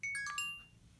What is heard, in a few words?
Insta360 Power Off